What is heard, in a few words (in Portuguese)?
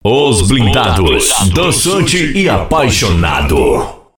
vinheta